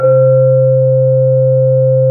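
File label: Vibraphone Oct C3, Fairlight CMI Mallets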